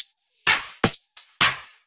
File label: Electro Loop 128BPM; Vengeance Samples